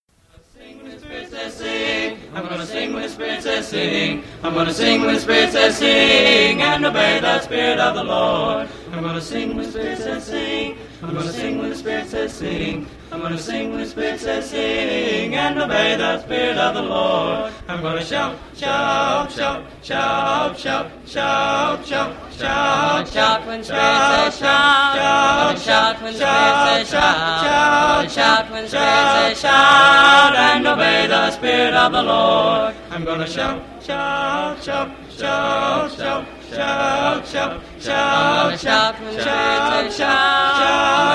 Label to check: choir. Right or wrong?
Right